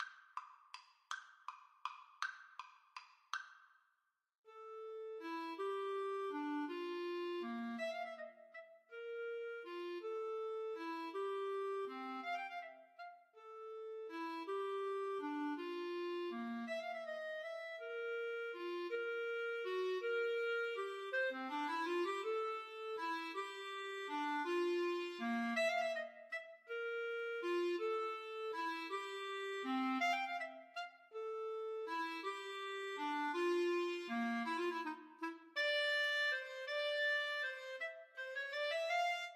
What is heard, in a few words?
waltz, Clarinet Duet